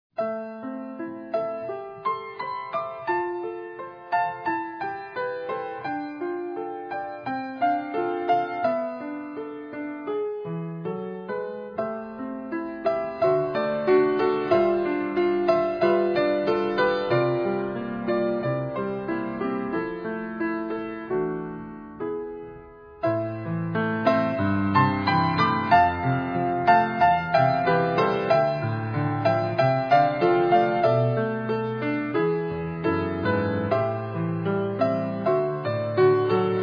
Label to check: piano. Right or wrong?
Right